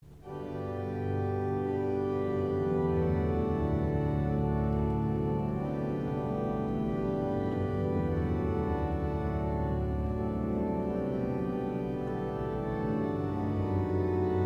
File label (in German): Gambe Reihe, orgel